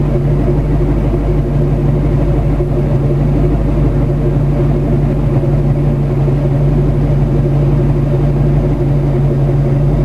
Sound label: 91 mustang lx exhaust sound 0700 rpm; livonia mi us